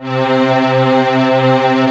STR C 3, Mellotron Strings; Orchestral; Samplefusion; Mellotron 02; SYM

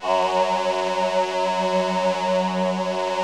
VP330

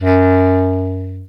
Bass Clarinet F#1; VarazdinOrchestral

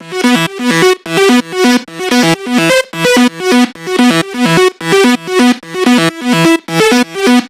synth 128 Em; Electro; Anthemic; synth loops

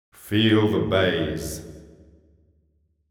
deep house male, 125, A, vocals

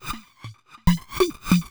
TECHNO EXTRA 140

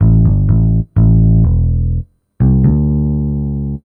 DEEPHOUSE BASS 125 A

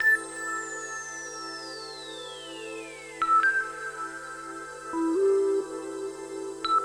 SPACE PAD 140 A; SpaceSounds

